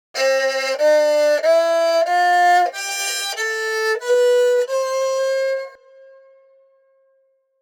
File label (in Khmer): ទ្រសោ Stringed Instrument; រឿង នំដំឡូងដុត (Cassava Cake)